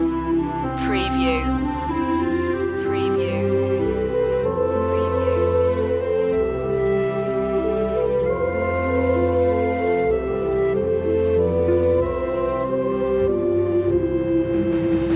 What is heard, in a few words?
orchestral loop; the first noel; media music now